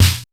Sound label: LM SNARE; SNARES; Back In Time Records - Big Bang